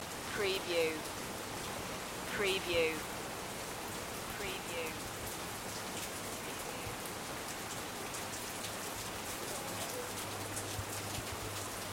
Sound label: Florida Keys Light Rain Loop